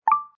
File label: notification